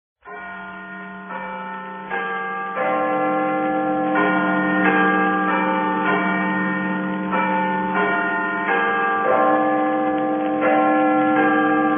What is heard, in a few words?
radio news erkennungsmelodie; 1941